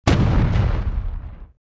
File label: Ink Boom